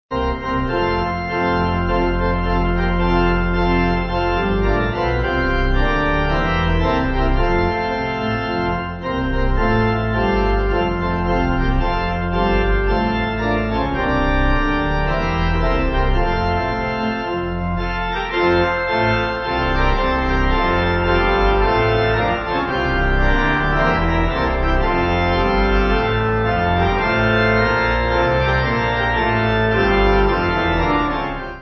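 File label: Pipe Organ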